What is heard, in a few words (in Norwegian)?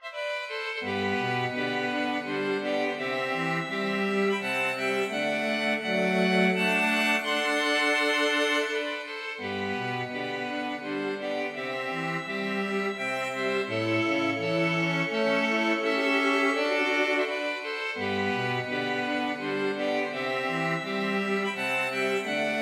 Menuetto for strykekvartett